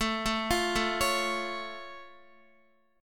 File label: A Augmented A down Guitar; A+ arpeggiated